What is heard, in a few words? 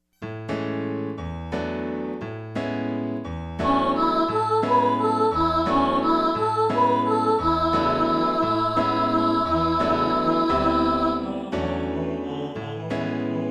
soprano, choir